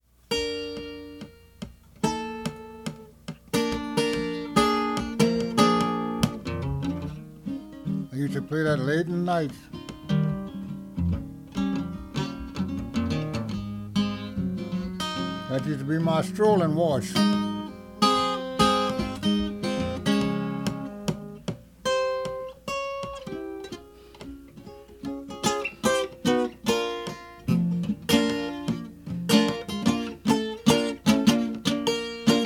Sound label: Waltz